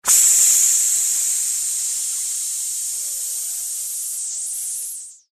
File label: breath